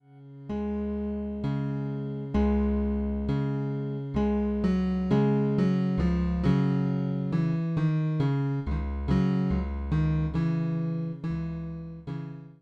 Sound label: grand piano